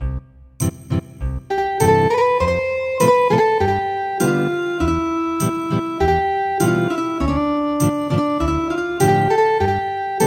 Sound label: Ethnic Guitar; Good evening Kings